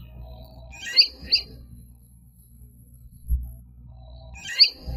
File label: cat yowl